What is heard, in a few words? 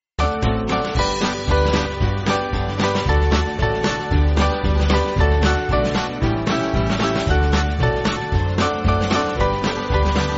Spiritual Band; Every Time I Feel